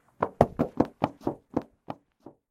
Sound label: run